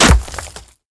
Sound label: spider monster foot hit